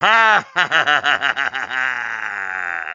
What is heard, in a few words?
laugh; wario